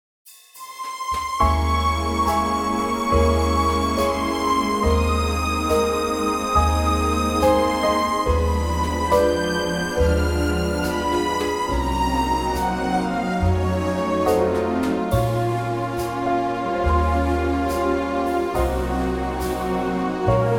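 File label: Ab orchestra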